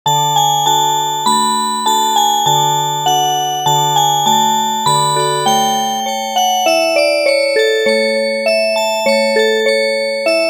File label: Oberek Music Box